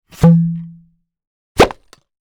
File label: tube doink comic pop